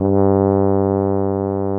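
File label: tuba G1 stereo; Greytsounds VOL-1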